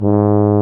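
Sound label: brass, C Tuba; Tubas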